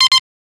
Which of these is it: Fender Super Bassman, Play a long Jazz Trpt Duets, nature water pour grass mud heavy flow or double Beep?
double Beep